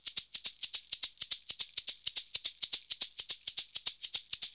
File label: SHK, Shaker; Univers Sons; Basicussions